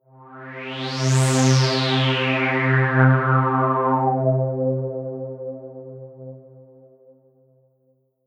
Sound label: Synth Env Flt Env